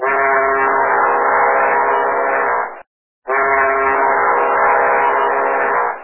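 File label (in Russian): очень громкий непонятный звук издаваемый на корабле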